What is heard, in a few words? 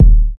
warm kick drum D# key, Royality free kickdrum sound tuned to the D# note. Loudest frequency: 72Hz; D sharp key; one shot